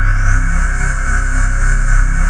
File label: VOX C2; Q Up Arts; Dream Experience; CHOR